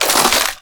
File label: fs fm glass, footsteps; player; Portal 2